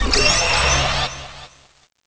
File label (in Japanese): 変身ベルト回転; super otousan